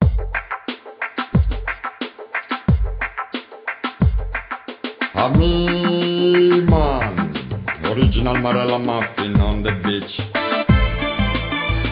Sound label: chime